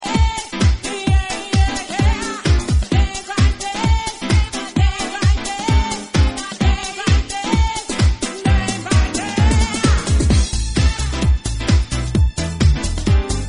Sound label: jazzy vocal mix